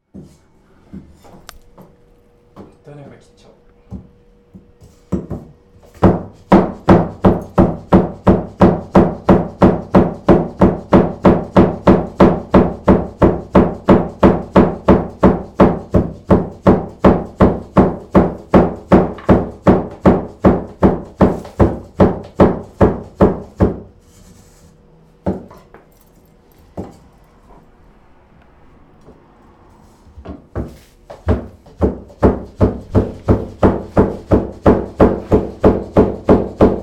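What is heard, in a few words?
sound of cutting soba; そばぎりの音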